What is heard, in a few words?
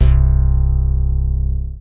big warm tone, synth